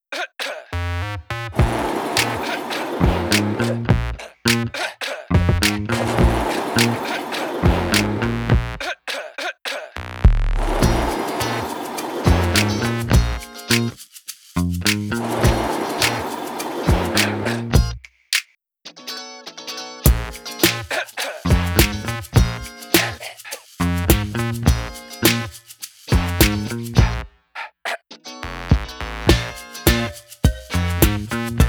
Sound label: engines off backing